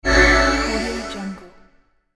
beautiful hopeful video game confirmation menu sound